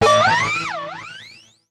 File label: KEY SHOT TEKNO 140 A; Techno2; Singleshots